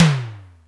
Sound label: TOM, Simmons SD-8 Drums